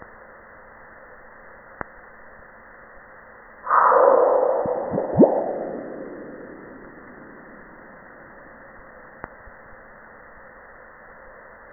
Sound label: Sound of Meteroid Striking Mars; Insight